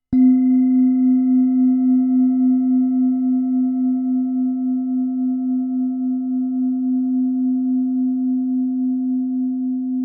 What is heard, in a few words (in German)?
klangschale sonne